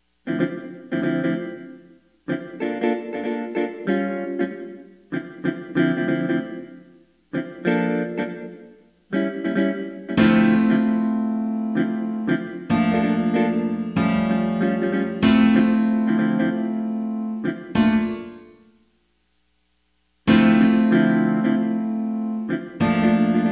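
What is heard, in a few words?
smooth jazz piano guitar harmony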